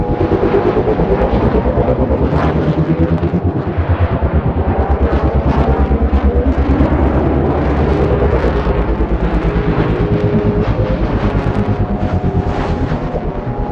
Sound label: Dropship Large Flying Loop; Warframe; Venus